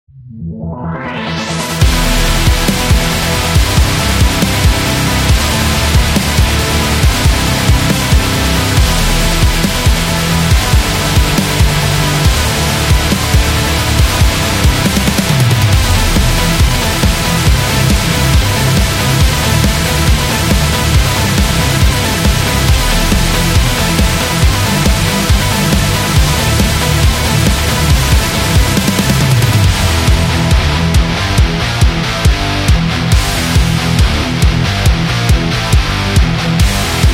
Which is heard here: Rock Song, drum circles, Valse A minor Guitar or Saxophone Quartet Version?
Rock Song